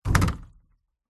industrial large heavy 10l tank plastic full water set down hard ground; zapsplat